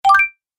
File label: Gameplay Bonus, video game